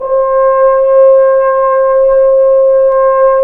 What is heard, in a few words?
BRS FR. HO, French Horn pp; Roland L-CDX-03 Disk 2; F.Horn 3 pp